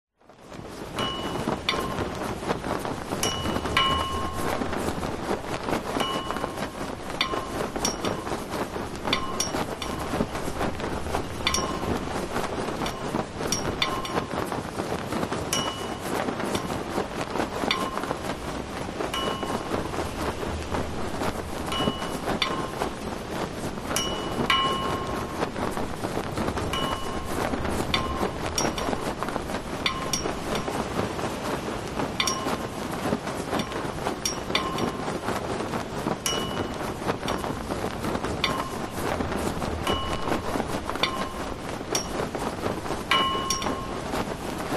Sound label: flag blow wind strong rope pole hits no wind